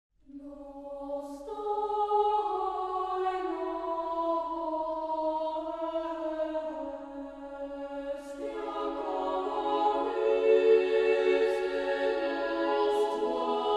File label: boys choir; Orthodox Music; Достойно ест, П. Динев; Sofia Boys' Choir